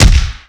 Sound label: Mega Face Punch, hand to hand combat; Sony Pictures Sound Effects Series